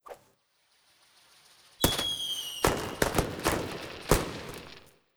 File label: firework